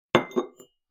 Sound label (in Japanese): 受け皿にティーカップを置く音